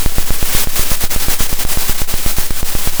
pop, Sound of a drum roll

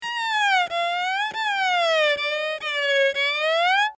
violin Glissandi

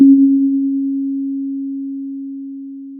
pop, Sound of a church bell

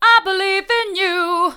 i believe in you hard, vocal

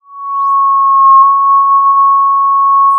Sound of a police car siren

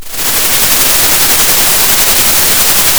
Sound of a thunderstorm

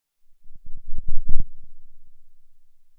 pop, Sound of a thunderstorm

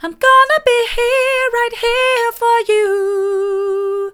mid, vocal

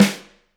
room Rim, drum

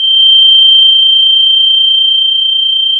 Sound of a tea kettle whistling

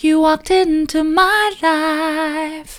soft, vocal

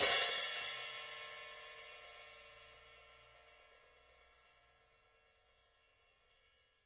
room Op Hat, drum

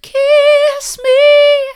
kiss me mid, vocal

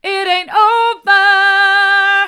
it an't over mid, vocal

